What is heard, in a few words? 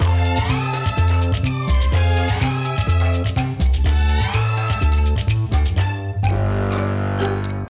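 food world music paradise fight no guitar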